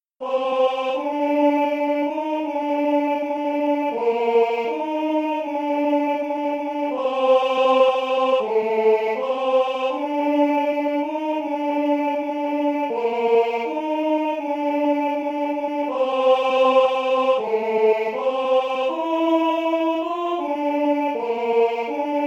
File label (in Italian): Coro Tenore; Willaert; O bene mio